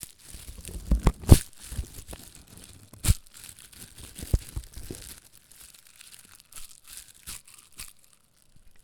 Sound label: Crumpling Dry Leaves; Walker Lab